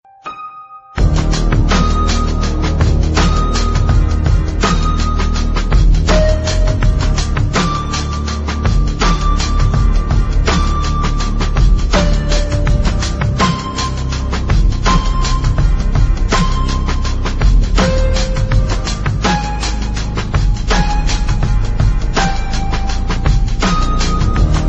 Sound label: piano